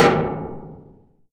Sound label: Drum Barrel Hit